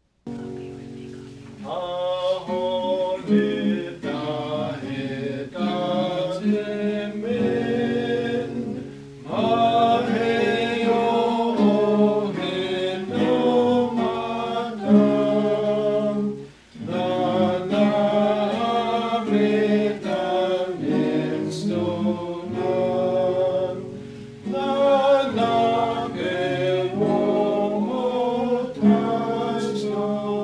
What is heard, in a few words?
hymnbook songs, cheyenne language; Hahoo Netahetatsemeno